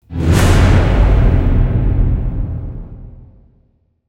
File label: Orchestra Hit